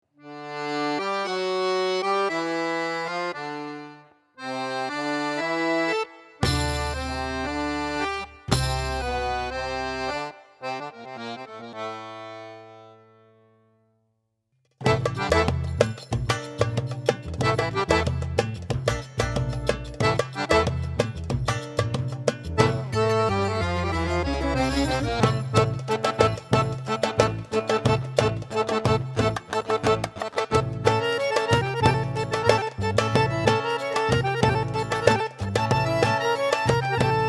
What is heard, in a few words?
Oriental